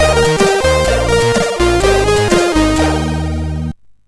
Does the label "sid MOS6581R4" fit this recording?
Yes